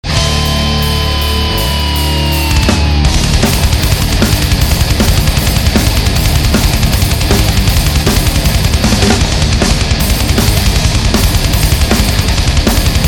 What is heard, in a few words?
metal